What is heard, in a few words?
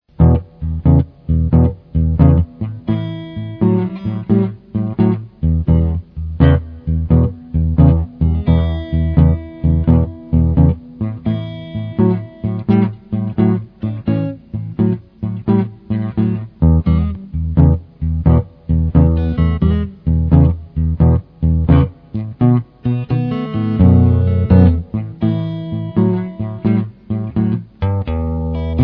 blues guitar